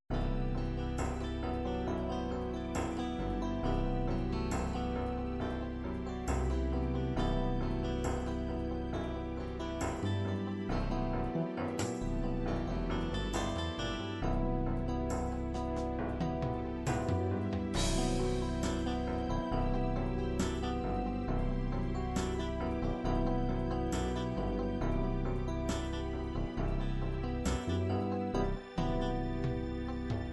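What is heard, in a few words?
ballads ballad slow ballad, midi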